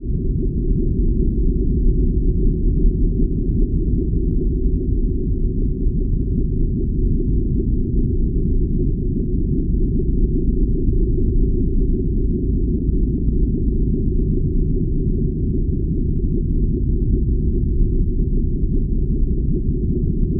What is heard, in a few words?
Nuclear Submarine; Large Vehicles; Blastwave